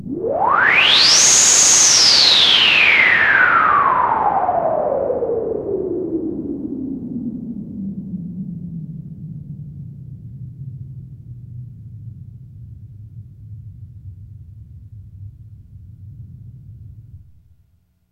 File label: NOISE SWEEP, SYNTH; Keith Emerson Signature Series